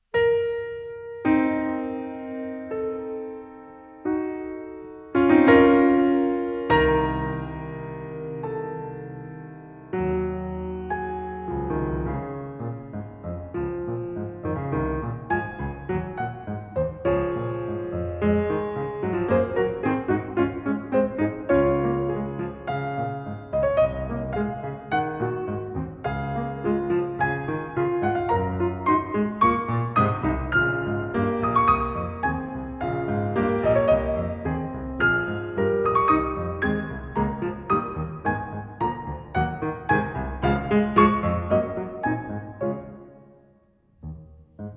string quartet in b-flat major andante con moto ma non troppo